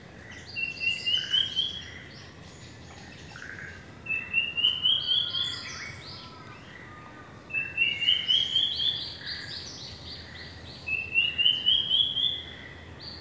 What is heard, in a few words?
Asian Drongo-Cuckoo